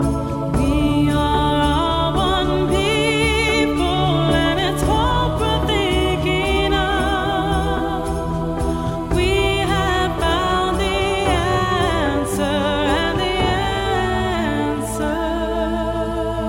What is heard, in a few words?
Native American Love